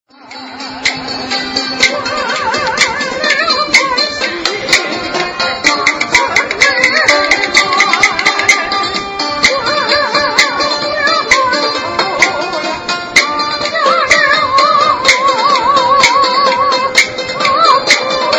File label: zither hangzhou; china; terratravel